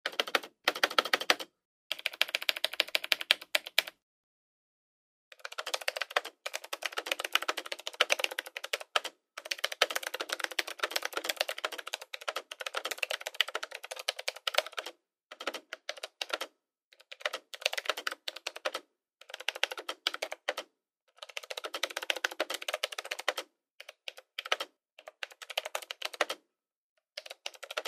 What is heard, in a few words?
Keyboard sound